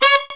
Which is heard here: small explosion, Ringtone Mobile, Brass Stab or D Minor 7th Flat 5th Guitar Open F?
Brass Stab